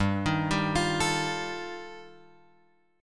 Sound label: G 7th Suspended 2nd Sharp 5th G chord; G7sus2#5 Chord {3 6 5 X 6 5} arpeggiated